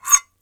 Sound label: Metal Scratching